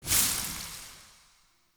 Blood Lust Leaf Burst; Powers; Fey; Champion

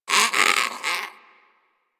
Rodent Vocal Tranq Dart Grunt; Warframe; Hunting Critters

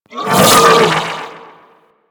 Amalgam Override Vocal Pain; Warframe

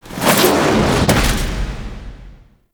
Fly Inner Depths Detach Land; Warframe; Sentient Devourer; Sentient Spawn Outdoors

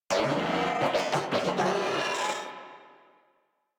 Vocal Idle, Enemies; Warframe; Moa

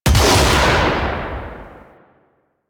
Finisher Shotgun Shot; Symbilyst; Warframe; Quests; The New War; Kahl; Weapons

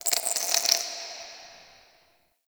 Under City Insect Wet Scurry

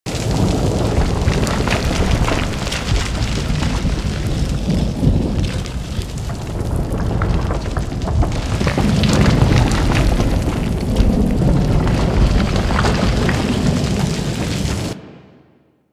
Giant Rock Movement Loop; Warframe; Man In The Wall

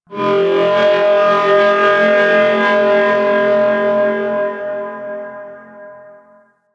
Temple Chant Texture; Ambience; Warframe; Corpus Ship Remaster

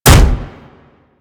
Contact Hit Effect; Vehicles; Archwing

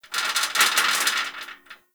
Rattle Metal; Warframe; Corpus Gas City; Ambience

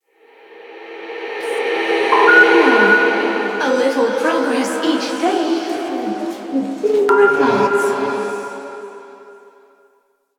Interior Cave, Ambience; Zariman Thrax Memories; Warframe; Duviri

